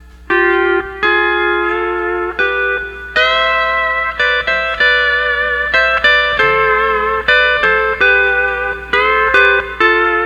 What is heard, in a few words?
12 String Lap